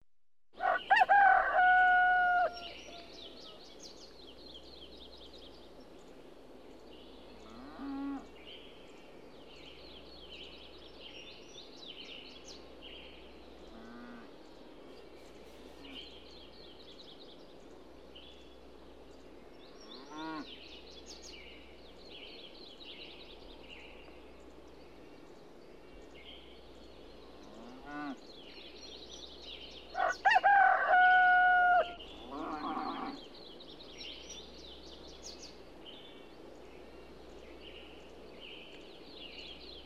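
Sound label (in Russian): деревня петухи и коровы на фоне птицы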